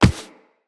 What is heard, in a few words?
Walk Default, Footsteps; Operator